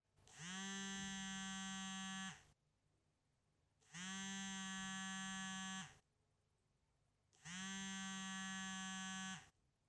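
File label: phone vibration sound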